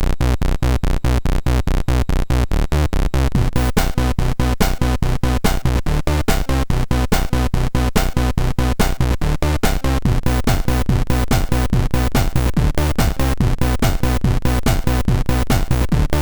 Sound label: Crash, sid MOS6581R4